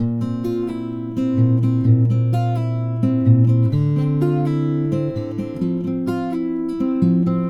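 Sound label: Acoustic Latin Guitar 128BPM D minor; Vengeance - Essential Clubsounds Vol.4; Guitarloops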